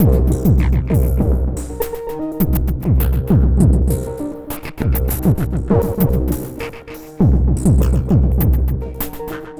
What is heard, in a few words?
Drums Strange